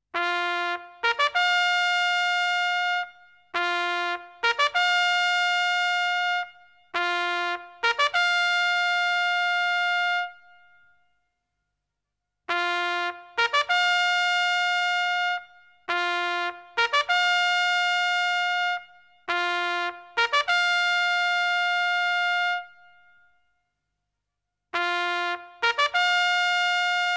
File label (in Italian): suonerie tromba militare